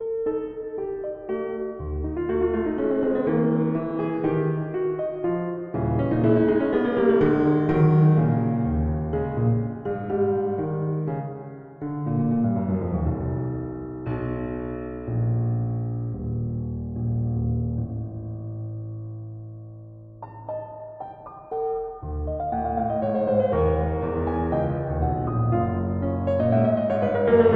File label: Quarter-tone Piano; Quarter-tone Piano Prelude No. 8